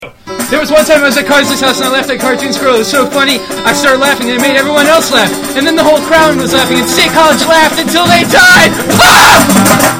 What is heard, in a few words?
10 second song about the cartoon squirrel that made taylor laugh so much; shortsongs